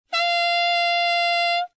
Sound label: G6 alt, saxophone tenor